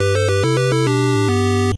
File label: Float Tile; Sesame Street ABC; NES